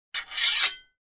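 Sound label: from sheath; Звук мечей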